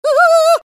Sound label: Halloween scream